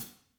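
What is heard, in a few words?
hats, Interactive Drum Kits; Sonic Reality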